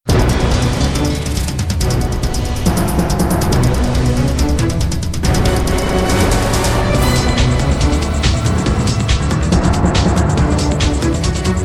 Action Short; Emergency 3 Mission Life

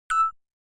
Simpe Cute Alert

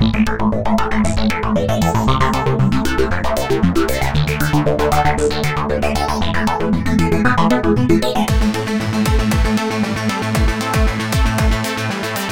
launch 110bpm; Jackpot 777; Wii U

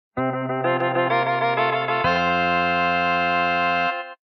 Mission Complete; Double Dragon; Zeebo

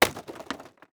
plastic break

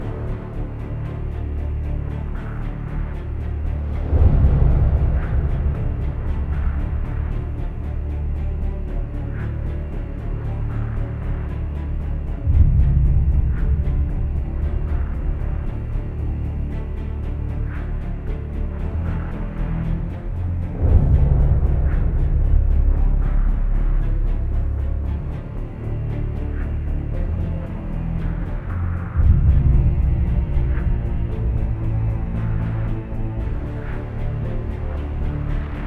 Dialogue Tension; The Dark Knight Rises; Android, iOS

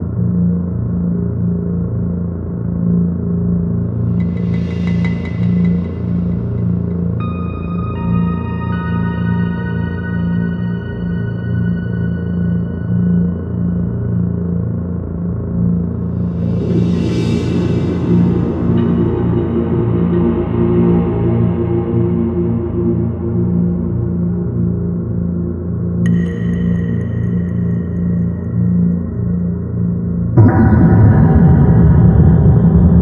Level 1 Boss Prefight

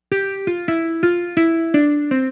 Guitar; lego my style kindergarden

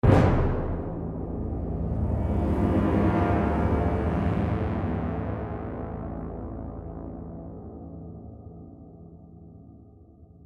Stingers - Tension; Planet Alpha

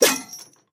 Chandelier Chain Break; Sfx - Chandelier Chain Break Var1

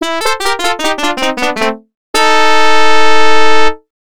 You Lose; Champion Prowres Special; PC-8801

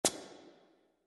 Cutie Stomp; Fancy Pants World 1 Remix

